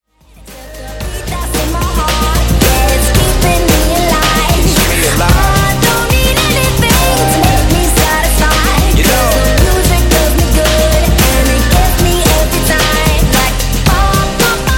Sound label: Throwback HipHop Megamix 112 83 Bpm